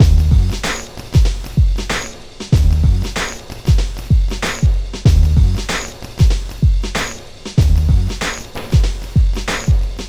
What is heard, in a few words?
Beat 095, Hip Hop